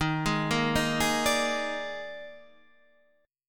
Eb Major 7th Suspended 4th Eb, Guitar, Standard; arpeggiated; 11,11,8,8,9,10; EbM7sus4

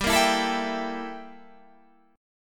G Minor 6th Add 9th G down

